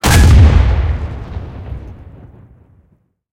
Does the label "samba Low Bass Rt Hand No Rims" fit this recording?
No